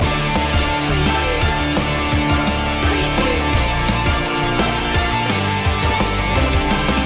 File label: 8bit rocktronic loop